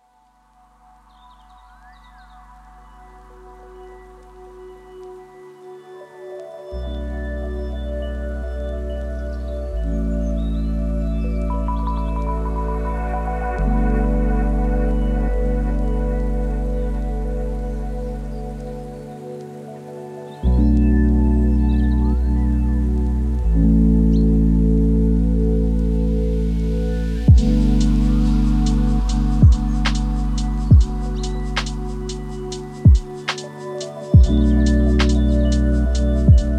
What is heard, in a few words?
lofi hip hop